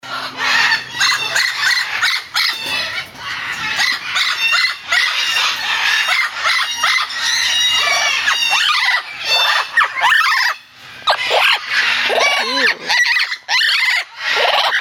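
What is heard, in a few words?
Blue Cheeked Amazon Parrot, parrot calls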